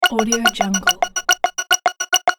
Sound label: working device calculating process